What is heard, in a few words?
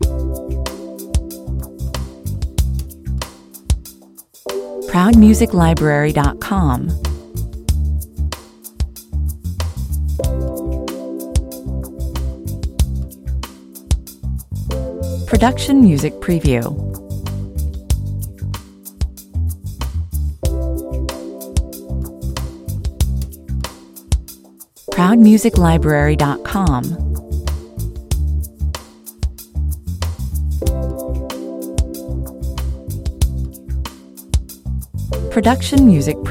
on hold, loops, 94bpm